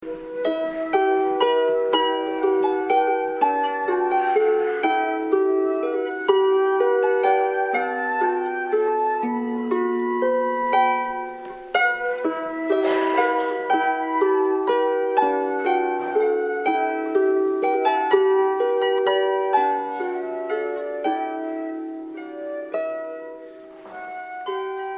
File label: Instrumental; harp, Flute